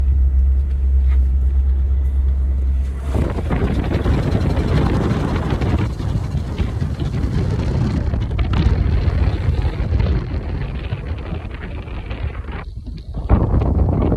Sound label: mechanical noise big clunk hard reverse jerk; discovery ii